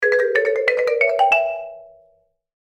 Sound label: marimba ringtone